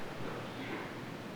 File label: ship